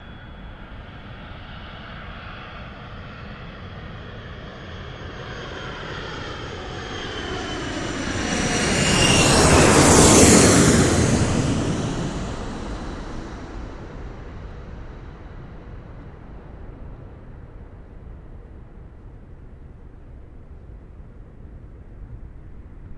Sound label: Jet Fly Over Airport, land & takeoff